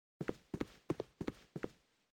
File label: footsteps rocks; baldursgate